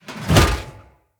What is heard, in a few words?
Shield Whoosh; Weapons; Enemy; Blunt; Hammer And Shield Weapon